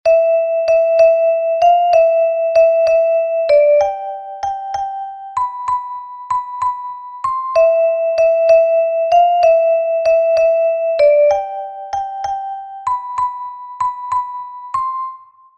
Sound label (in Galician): Metalofono Soprano; NON CHAS QUERO